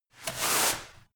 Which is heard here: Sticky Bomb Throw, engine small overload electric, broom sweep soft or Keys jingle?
broom sweep soft